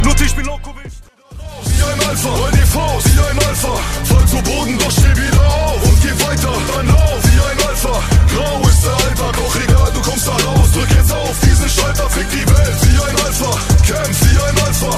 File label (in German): deutsche rington